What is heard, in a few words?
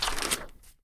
Book Pickup; Keepsake